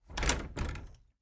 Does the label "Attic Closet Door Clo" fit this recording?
Yes